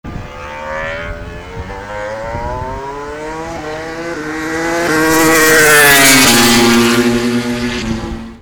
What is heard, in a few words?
Racing Sound